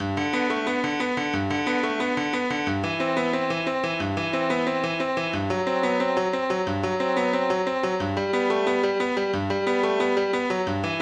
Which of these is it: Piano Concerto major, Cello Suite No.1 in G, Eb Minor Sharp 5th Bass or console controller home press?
Cello Suite No.1 in G